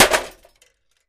Vcr Hit Sledge Hammer; electronics

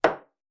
glass on counter

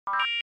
Ascending 4 Tone Bee, beeps

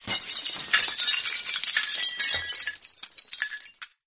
Glass Falls Medium; windows & mirrors

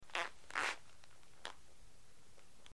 Rubber Squeaks, rubber stretch

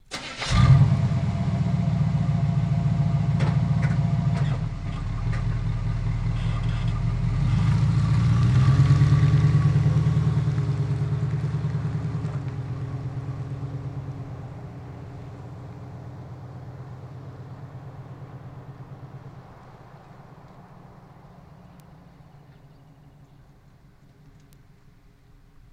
Blazer Strt Awy Slow, chevy blazer

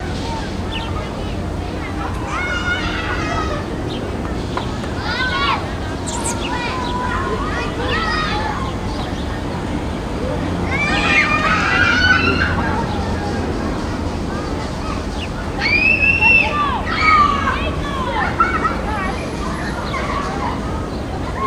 Children Play Outsid

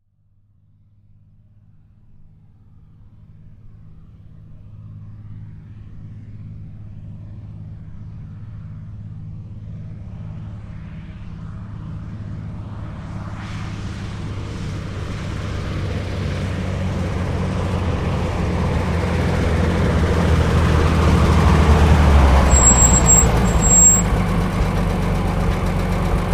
Truck 2.5 T Med Idle Off; 2.5 ton

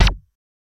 Gun Shot Silencer, silenced singles

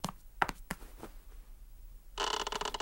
Chair Squeak Softer

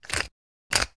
Pepper Grinder Vari

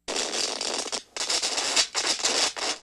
Elect Arc Sparking, arcs & sparks

